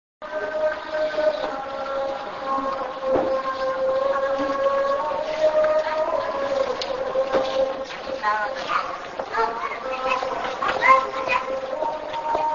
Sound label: azan, kashgar